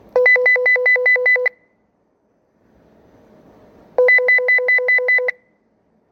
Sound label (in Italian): campanello